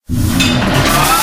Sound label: voidfold cannon charge